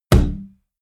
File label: can heavy hit saturated